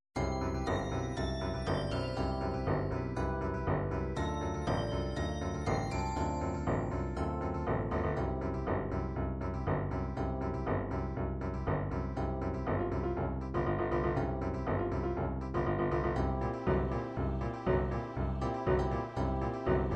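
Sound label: disco party, midi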